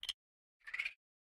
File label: spin, coppergolem; Minecraft